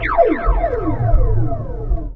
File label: fx scifi; Ueberschall; Techno Trance Essentials